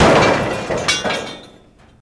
Jeep Crash Debris, car crashes

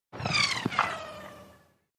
Right To Left, bullet bys

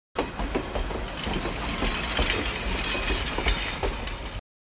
Diesel Train Wheels, rails & rumbles

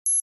Flash Beep Elect Tone

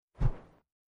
Single Fan Blade Whoosh

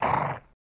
Horse Blow Generic

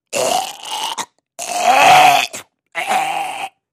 Snotty cough gags; coughing & spitting

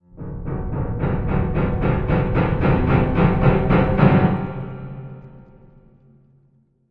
Music Piano B Horror

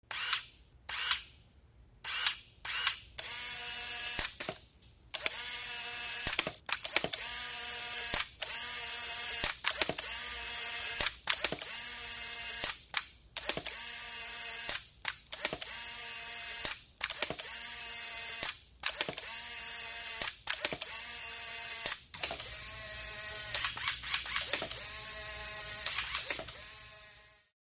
Camera Multiple Shot; shutters & movement

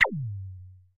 laser; lasers

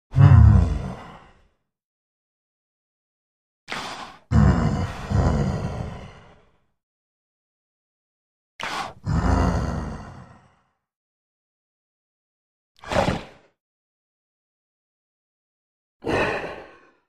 Beast Sleeps Monste

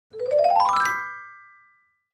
Clavichord Ascendin

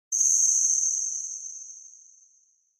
Chirp Reverb, multimedia element

